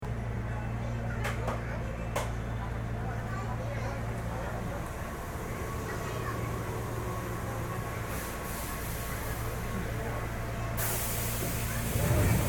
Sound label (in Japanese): 2600系, 走行音; 京阪電鉄; 2600系本線丹波橋〜墨染; 本線系の各線でみられる車両です。